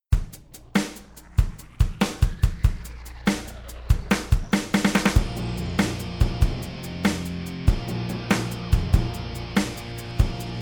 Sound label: Timpani